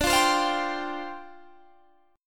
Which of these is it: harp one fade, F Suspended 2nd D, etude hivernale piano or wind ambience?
F Suspended 2nd D